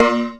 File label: A#, Synclav FM PERCUSSION; Big Fish Audio